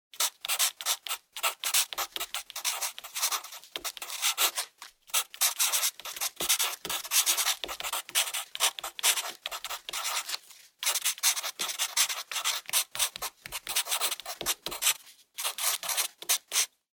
Pencil (Write)

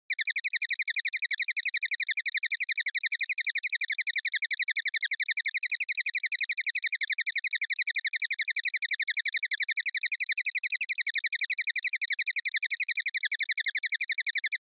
Onoma Panic High Long

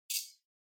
Fluorescent Light Noise Far Spark

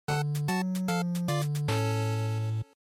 NES Action Game Over

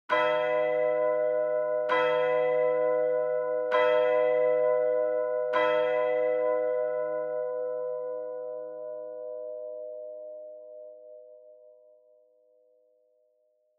Church Bell, Low Long

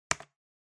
Trackpad Click L Hard

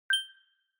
Inspiration High; Onoma

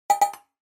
Percussive Accent

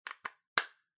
Kitchen Timer Battery Insert

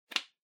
Tablet PC USB Plug In

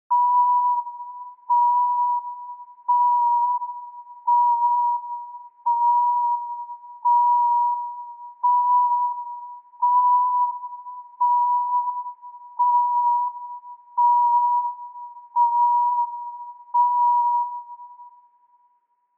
Retro Anime System Fast

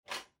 Portable CD Far Battery Cover Open

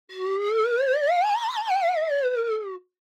Slide Whistle Phrase

